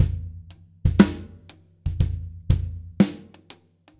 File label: Shuffle, jazz